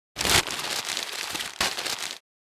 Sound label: Newspaper crumple; gfxsounds